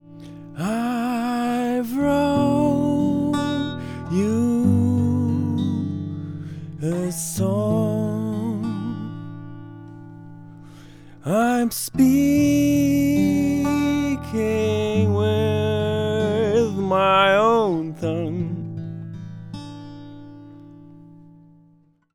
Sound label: Singer Songwriter